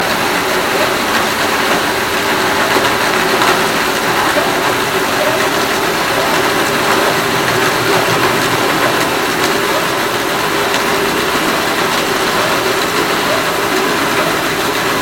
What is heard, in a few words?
Hydrophones, orcasound